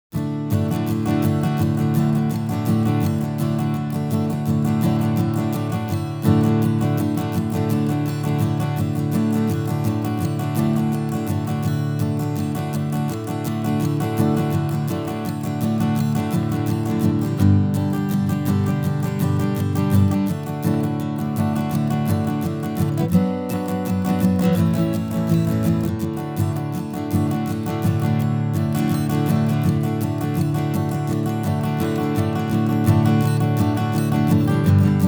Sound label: Blues Acoustic Martin; BMW Blues EWU